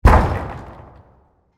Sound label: Hockey Check, Body, Check, Into, Boards; Sports